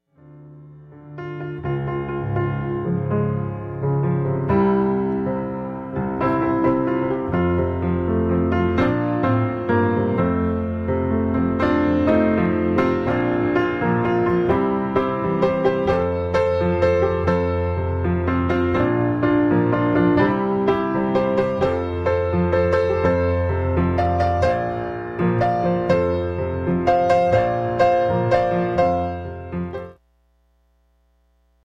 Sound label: piano